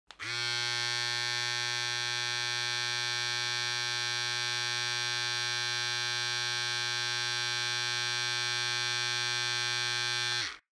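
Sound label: sound of trimmer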